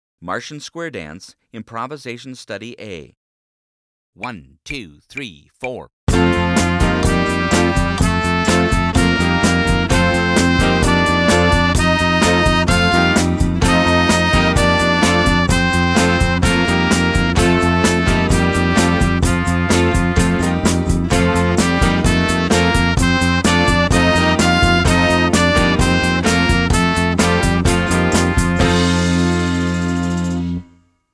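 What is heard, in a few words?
improvisation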